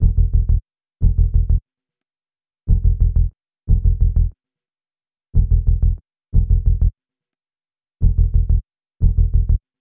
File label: Bass, Hip-Hop